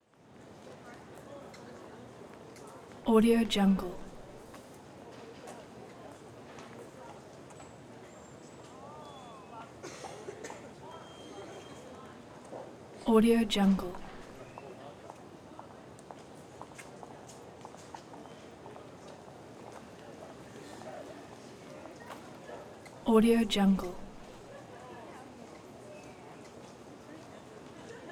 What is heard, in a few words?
outdoor walla small medium distant group